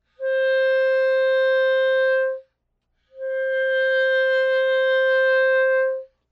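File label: Vibrato, clarinet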